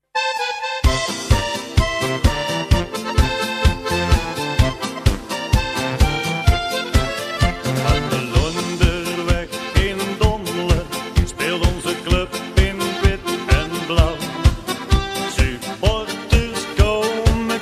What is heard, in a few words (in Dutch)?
Clublied; Clublied RKVV Dommelen